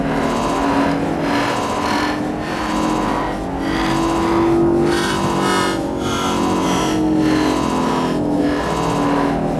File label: Drill Building Loop; Props; Loops; Warframe; Venus Open World; Venus Landscape